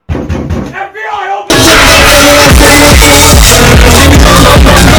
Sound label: loud